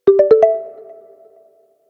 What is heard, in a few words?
prize notification qingchui; BLU G9